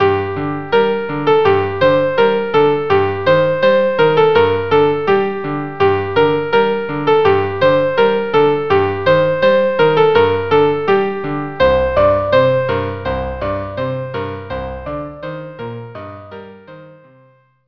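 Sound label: violin cello; Anah Halach Dodeich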